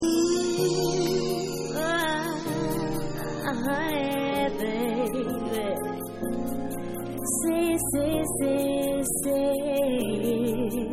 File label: Main Vocal Mix